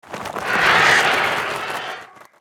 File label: Many Whelps Attack